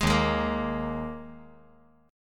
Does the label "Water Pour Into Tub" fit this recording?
No